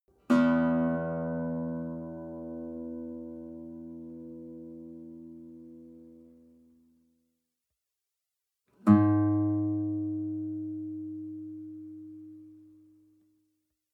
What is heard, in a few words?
western guitar single note low